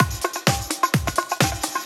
Latin Loop 128 BPM

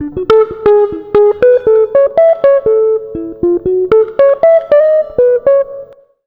jazz, acoustic guitar loops; USB Soundscan vol.04 - Electric & Acoustic Guitar Loops; AKAI

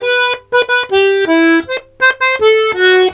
Accordions; USB Soundscan vol.40; AKAI

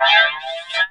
Korg, FX

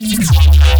Reese; neuro; BIG5AUDIO

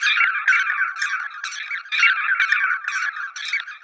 125 seagulls; Vocal Loops; Transmission-X

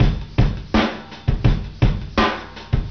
Processed Beats; RnB; GeorgePendergast

